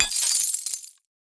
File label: fighting game designed destruction impact debris glass break; smash